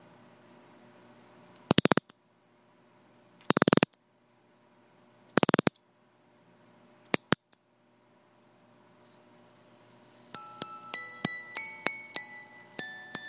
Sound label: music box mechanism